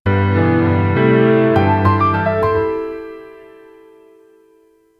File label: logo corporate